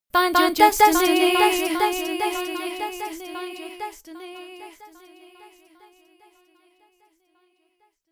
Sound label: echo chamber ping pong dela